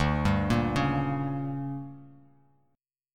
D Major 7th Suspended 4th Sharp 5th, Bass Standard; DM7sus4#5; arpeggiated; JGuitar